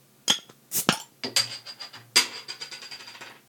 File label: opening beer bottle 330ml